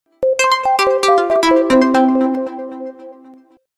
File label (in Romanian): Dual Sof Sms, ton de mesaj; tonuri mesaje